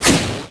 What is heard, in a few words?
ROCK